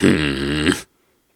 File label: Snarls and Hums, Viking Vocal